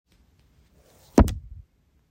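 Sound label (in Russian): один удар по деревянной двери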